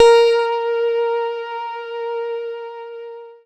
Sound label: PLUCKED, Synclav FM, A#; Big Fish Audio